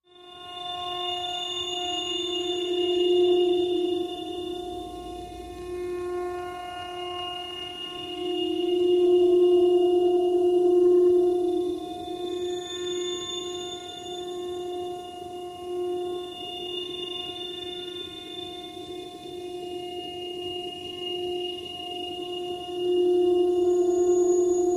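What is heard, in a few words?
Long Wait Space Transmission, scanners; Long Wait Space Transmission Ambient Caller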